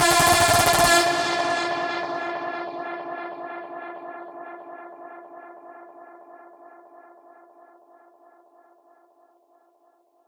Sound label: Voice Tweak F 140 BPM, Psytrance; Voices; Tweaks; Ghosthack; Psytrance Visions Volume 2